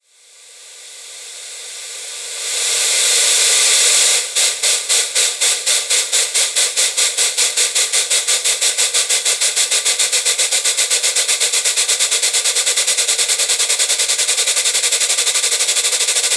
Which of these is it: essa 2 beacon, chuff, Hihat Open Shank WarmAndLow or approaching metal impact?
chuff